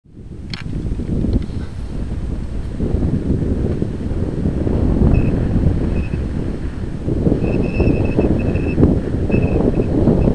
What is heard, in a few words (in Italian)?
vento